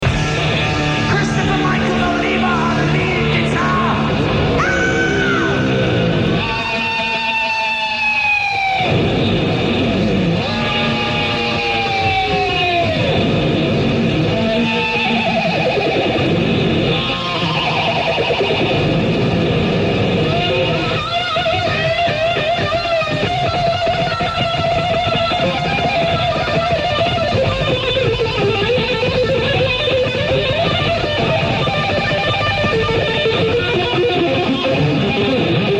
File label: Guitar Solo (Live)